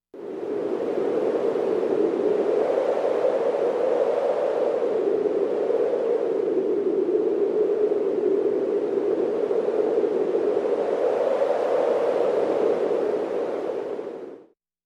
Cold Winter wind

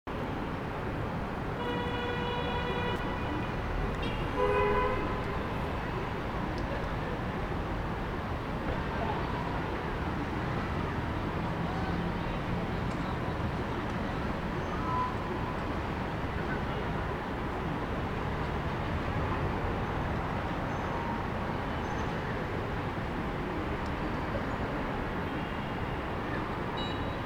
city above far ambience car

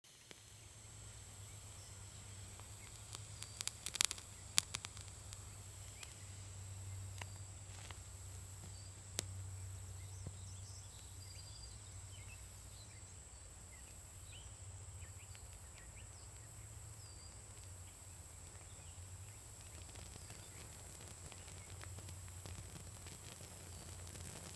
Flickering Light

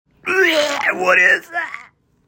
ugh

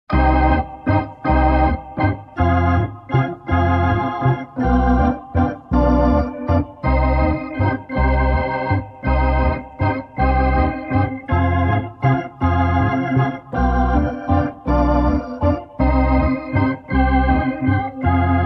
Circus Theme

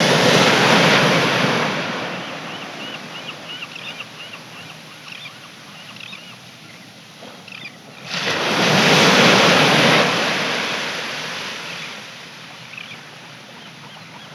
ocean recording, 120bpm; Sample Focus

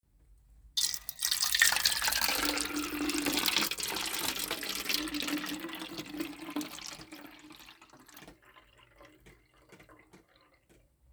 Pouring water in a metal cup, some water gets out

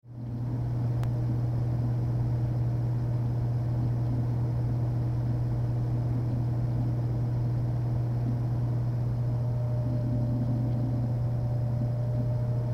Computer Fan

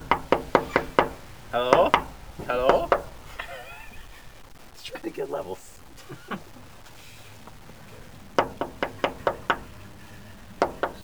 Door Knocking

